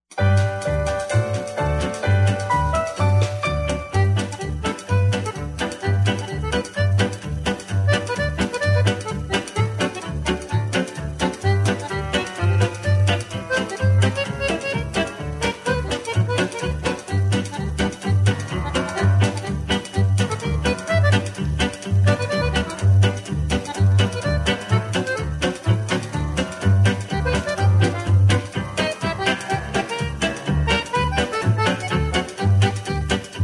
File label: chords galore, Instrumental TRacks, square dance music